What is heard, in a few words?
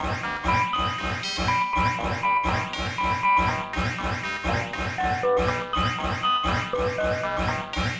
Electro